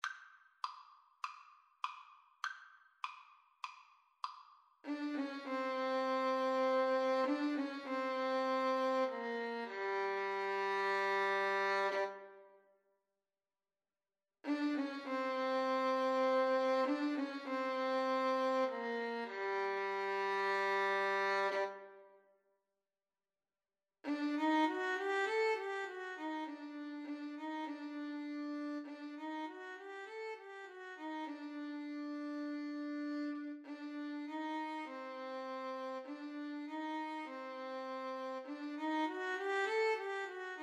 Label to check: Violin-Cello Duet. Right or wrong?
Right